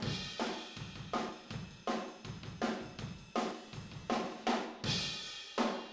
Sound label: Room ST, Drums, 162 BPM; Drums from the Big Room The Mixes; Take Your Toms Off; Chorus 05